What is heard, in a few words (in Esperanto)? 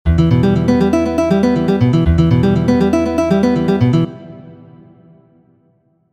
Studo pri senfina arpeĝo, kiu signifas, ke la dekstra mano ĉiam moviĝas same, dum la maldesktra mano esploras la fingrejon; allegro); Studo 1-a; mi minora